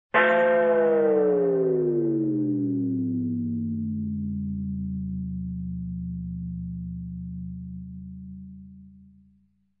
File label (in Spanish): error fallo